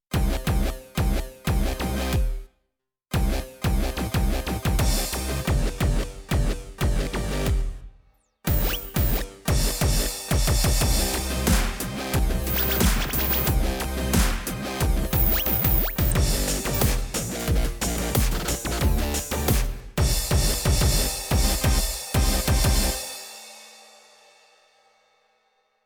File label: Tektonic Dub; montage